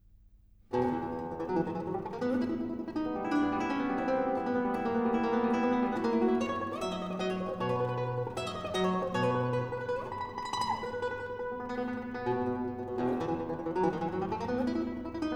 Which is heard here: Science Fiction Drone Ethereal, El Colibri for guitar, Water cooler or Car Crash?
El Colibri for guitar